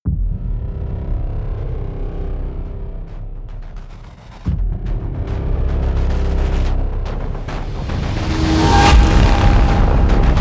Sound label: Cinematic Epic Hybrid Riser